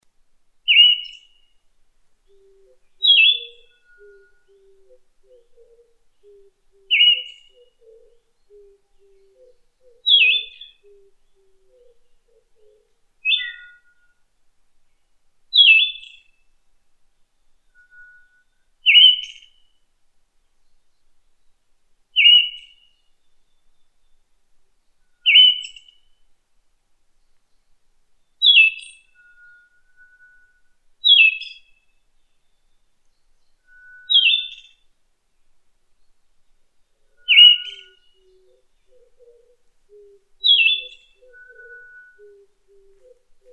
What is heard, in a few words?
mamijiro, bird